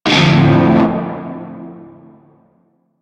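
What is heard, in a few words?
Shawzin Major Chord B; Music; Warframe; Narmer Shawzin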